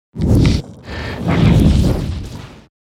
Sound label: Fire element spell